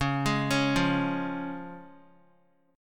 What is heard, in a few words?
Db Major Db Guitar; arpeggiated; JGuitar